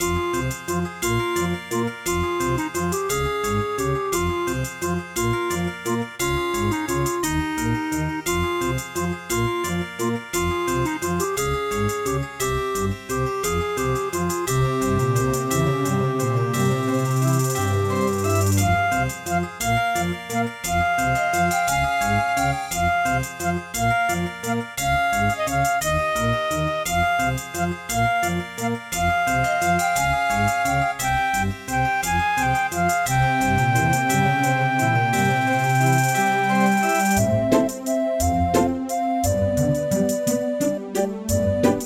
Casio CTK-800; Death Gate

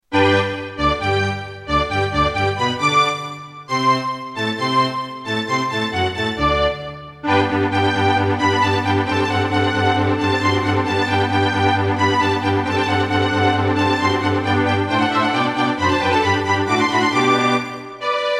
Roland SC-8820; Mozart - Eine Kleine Nachtmusik partial; classical

